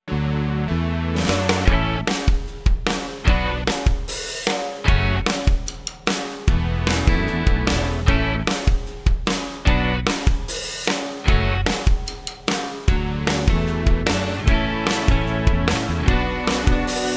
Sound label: Instrumental; Audition Cut